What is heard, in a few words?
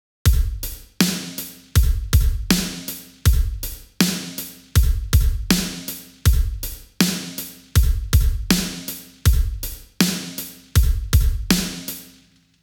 8beat